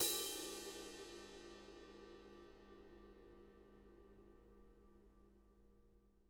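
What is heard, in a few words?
cymbal brush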